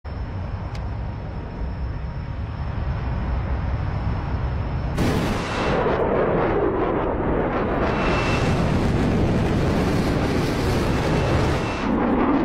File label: in the F-14 Tomcat